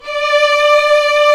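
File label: strings, 8pc, vibrato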